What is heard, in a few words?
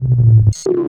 physcannon too heavy